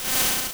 Vacuum Mouth; Zelda

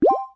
Get Heart; Pick up a heart (Recorded)

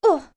Ledge Fall; Link falling off a ledge

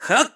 Sword Charge; Link; zelda sounds; Skyward Sword

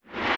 Scrape; Epona scraping her hoof

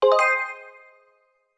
Calibrate; Zelda

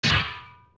sword rock; Sword hitting a rock; OOT

